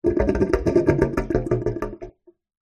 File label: sink plug gurgle from bath draining backwash pipe; household